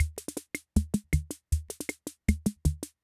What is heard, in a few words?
Loop, rhythm king; classic machines